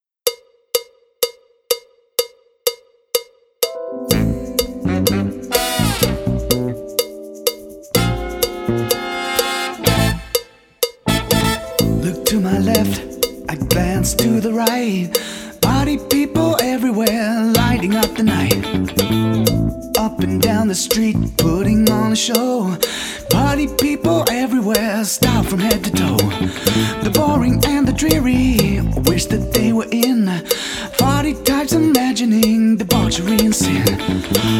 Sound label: backing track with click